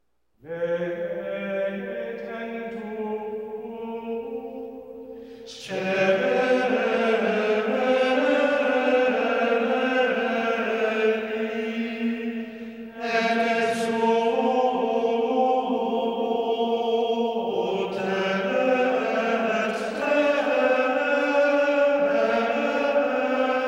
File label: Midnight Mass Offertory Gregorian Chant; pasodelnorte